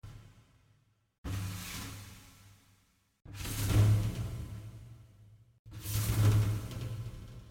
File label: vent noise